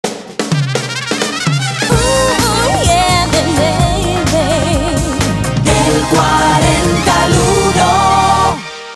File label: jingle; entrada del 40 al 1